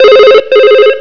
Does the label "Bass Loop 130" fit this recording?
No